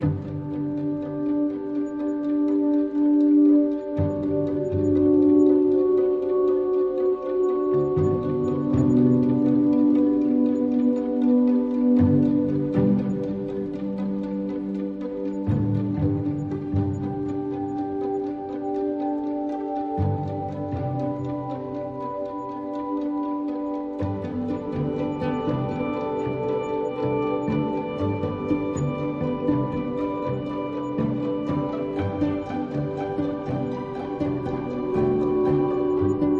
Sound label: Ambient